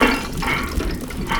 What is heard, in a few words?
CLANK; ENVIRN-AMB